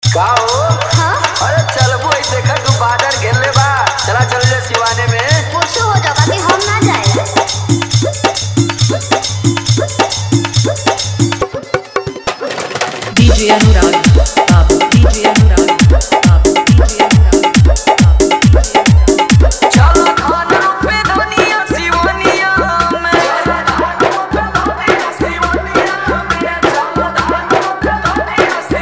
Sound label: Ropani Geet, Hard Bass Dance Mix